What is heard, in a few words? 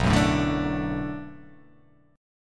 B Minor 7th B, chord; Bm7; strummed; JGuitar